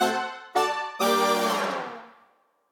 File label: Victim Rescue